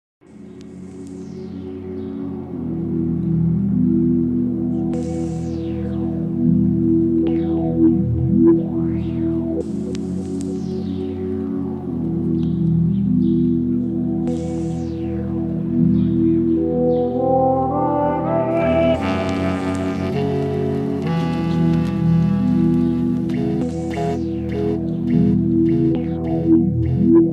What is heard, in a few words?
Downtempo mix